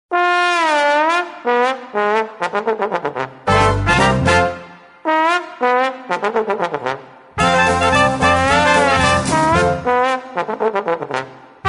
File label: creole trombone